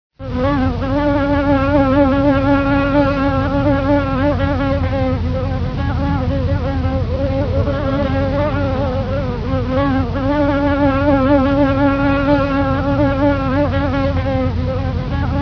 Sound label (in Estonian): kärbes